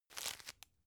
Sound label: tissue grab soft; objekte; taschentuch